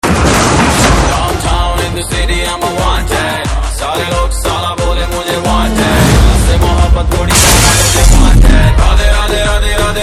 Trailer BGM Ringtone

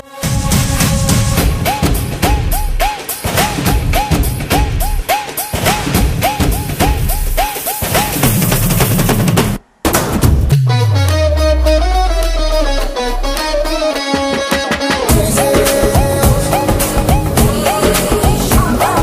BG Drum Beats Ringtone

